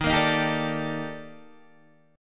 D sharp Major Sharp 11th D sharp Guitar Standard, chord; strummed; 11,13,13,12,10,10; capo=10